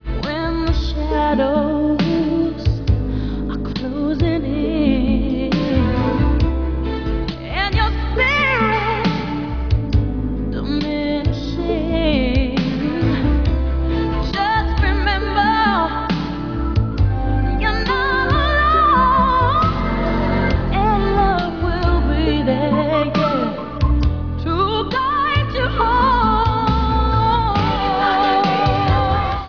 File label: soft